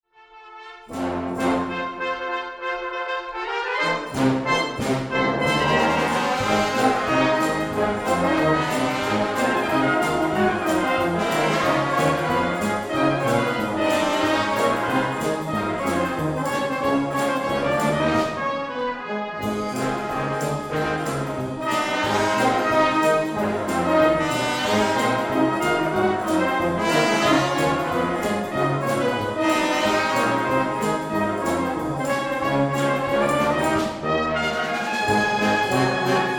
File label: Rock, brass